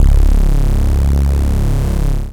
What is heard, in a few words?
Ultimate Bass Root C#; Vengeance Samples